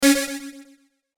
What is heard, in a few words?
Synth Stab Delay; Stab, Bright, Metallic, Hiss, Sweep; Multimedia; Production Element, Imaging Element, Accent, Transition; Stabs; Blastwave